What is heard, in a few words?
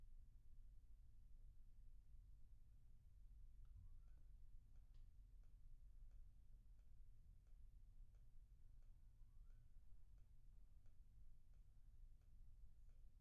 PIANO